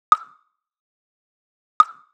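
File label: Perc Loop 125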